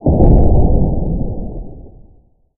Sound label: explode; dark matter mining